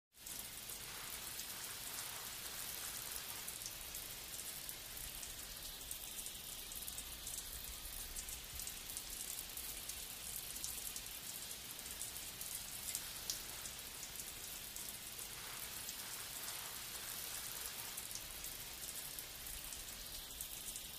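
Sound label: Shower - Heavy & Light Spray / Some Gurgles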